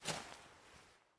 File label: Take item; miside demo